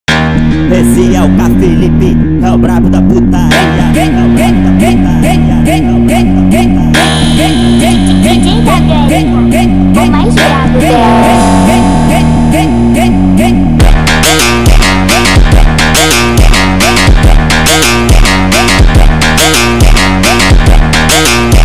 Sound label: beat distorce pancada sped up